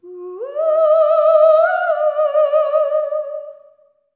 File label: SERENE, Symphony of Voices; Spectrasonics